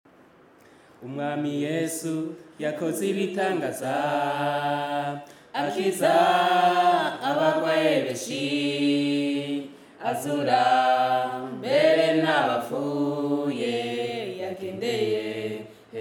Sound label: Rundi Song; Yesu agendesha amaguru hejuru ku kiyaga; Jesus Walks on Water